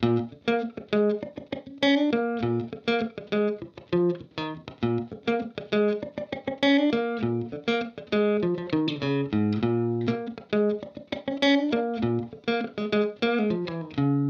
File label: fender singlenote 1x12; universal audio ox amp top box